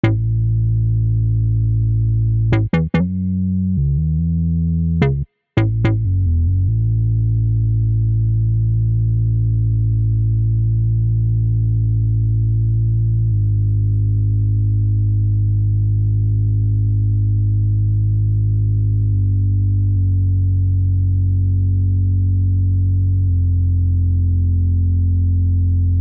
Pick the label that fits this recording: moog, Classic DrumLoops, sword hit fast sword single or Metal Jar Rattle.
moog